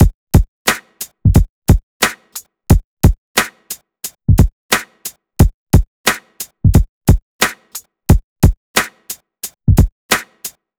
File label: BEAT, Hip-Hop & R&B; USB Soundscan vol.47; AKAI; HIHHOP